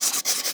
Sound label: Hard Slide Multiple